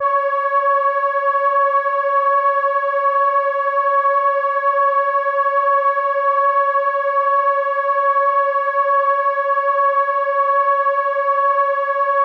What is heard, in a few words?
Horn C#6, ARP Solina